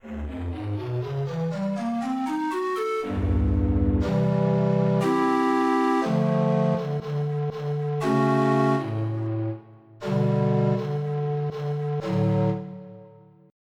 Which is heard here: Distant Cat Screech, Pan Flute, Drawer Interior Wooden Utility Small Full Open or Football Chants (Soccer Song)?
Pan Flute